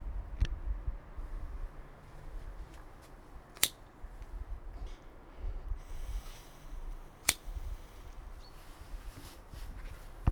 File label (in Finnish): sytkari